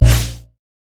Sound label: steam golem mana barrier hit; LoL; blitzcrank